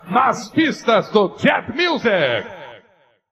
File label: jet music